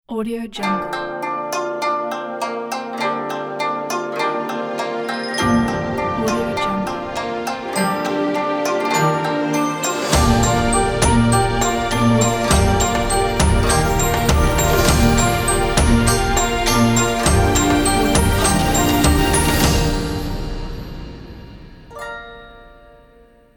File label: an asian intro logo